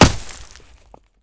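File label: Bullet Light Ballistic Dirt impact; Titanfall 2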